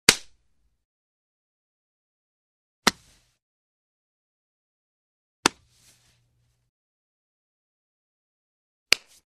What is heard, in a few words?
Hand Grabs, Slaps, & Pats Flesh Slap To Face; Hollywood Edge Foley Sound Library